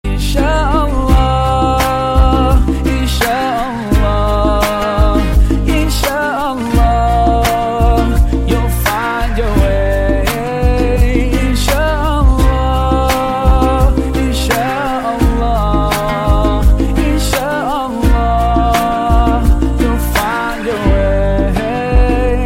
Islamic Ringtone

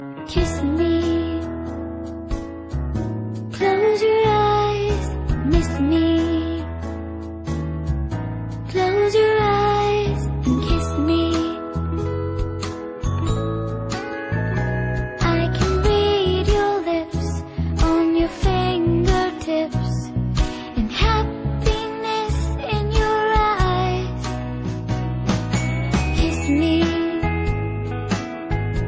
Ad Ringtone; Dairy Milk Silk

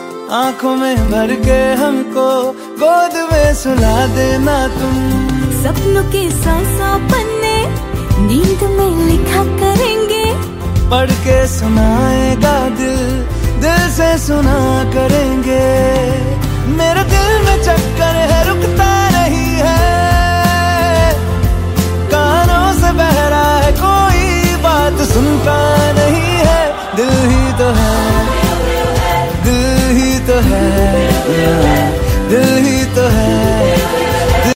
New Hindi Song Ringtone

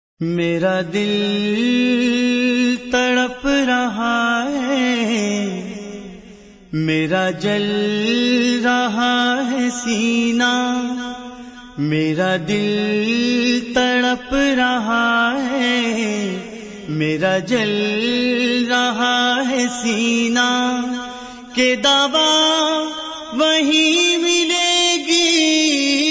Naat Ringtone

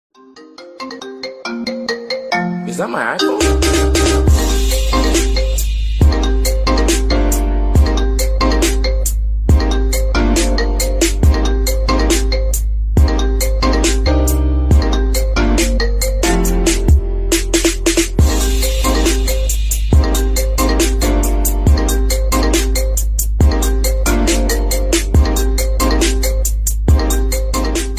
Apple Iphone 9 Plus Ringtone; ringtones

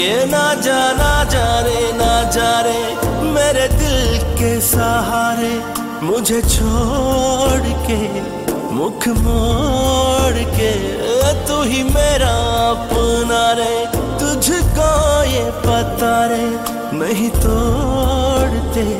Sad Song Ringtone

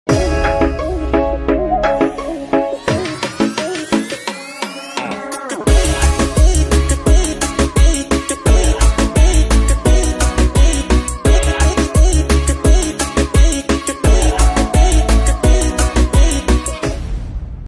Ringtone Only Music

